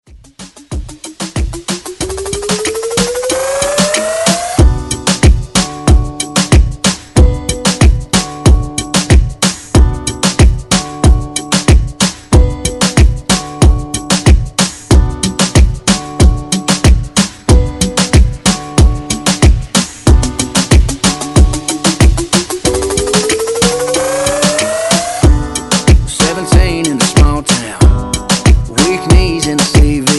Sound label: Moombahton Redrum